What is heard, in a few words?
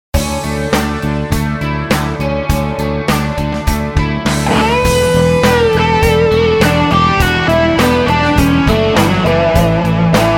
Cel 10 Vintage 2 x 10